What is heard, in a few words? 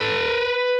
bend full